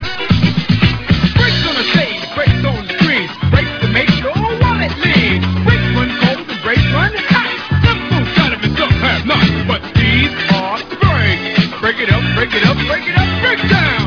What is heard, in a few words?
breaks